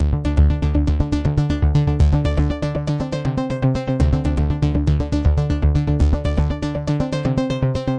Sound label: 120 echoey arpeggio synth D; SYNTH AND ELECTRONIC LOOPS